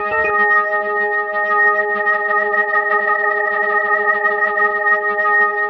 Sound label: organ G#, vocal house; PS-28 Vocal House Samples; organs